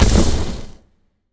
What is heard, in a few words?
poison hit; smite; serqet